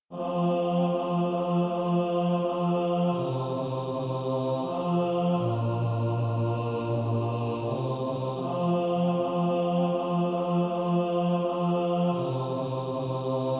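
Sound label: bass, practice music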